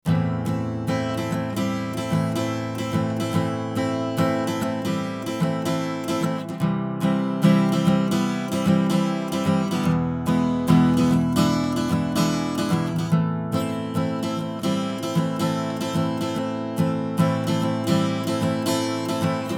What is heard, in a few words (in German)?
Western Gitarre Original; Fredenstein F610 UE1